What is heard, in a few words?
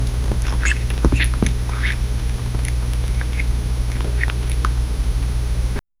a soft gentle rustling so, bunny